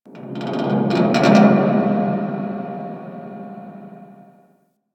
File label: Infested Distant Metal Creak; Warframe; Corpus Infested